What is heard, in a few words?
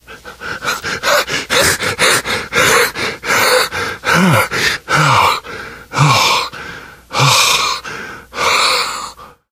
exertion major